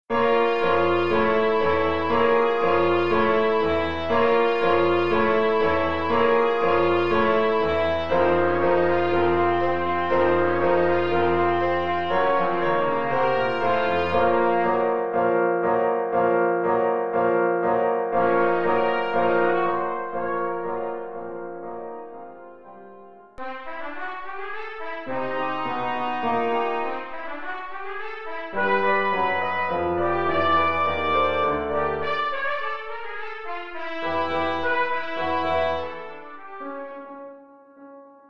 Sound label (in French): fanfares